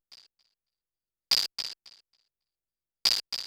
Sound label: CR78 fx, techno 138 bpm; Best Service ProSamples vol.54; AKAI; DAVE CEE